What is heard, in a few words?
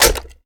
reload mag in